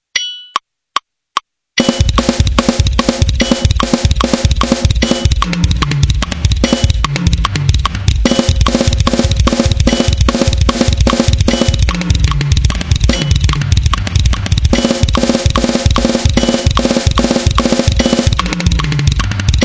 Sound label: fill